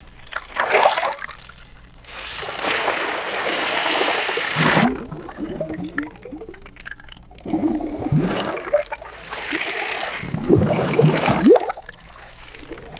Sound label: swirling water with splashes and bubbles; ambience